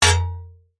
warfare sword strike metal could be shield deep clunk; zapsplat